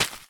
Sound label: Footstep Crayon Paper